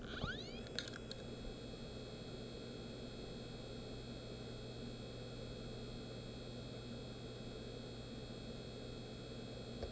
hd sounds, laptop, ok or unclear; mac disasters

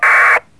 dig, digipeter; simplex; radio; foxhunting; stuck transmitters

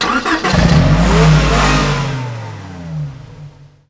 start engine; unreal tournament 2004; fav UT sounds

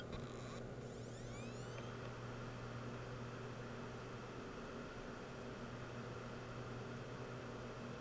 hd sounds, laptop, ok or unclear; mac disasters

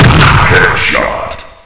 bang headshot; unreal tournament 2004; fav UT sounds

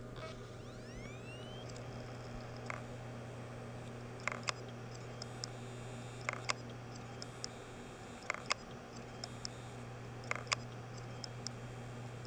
hd sounds, laptop, bad; mac disasters

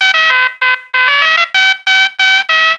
dixie; unreal tournament 2004; fav UT sounds

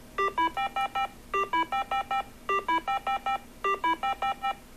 Fox; radio; foxhunting; Fox Box frequencies and behaviors

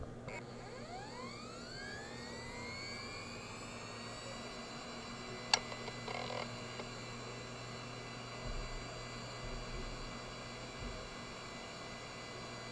hd sounds, desktop, ok or unclear; mac disasters

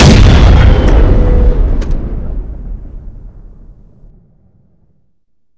tank fire; unreal tournament 2004; fav UT sounds

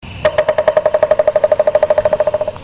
Pileated Woodpecker drumming; wildlife; birds; calls; woodpeckers

